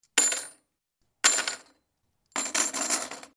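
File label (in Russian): высыпают крупные монеты по одной на стол потом несколько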